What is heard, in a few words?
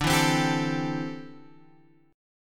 D 7th Suspended 2nd Suspended 4th D, Guitar Open F; D7sus2sus4; strummed; 14,11,12,14,12,x